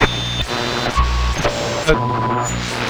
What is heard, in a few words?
Noise; Jet Grind Radio; Dreamcast